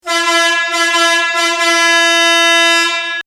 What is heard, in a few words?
Emergency Horn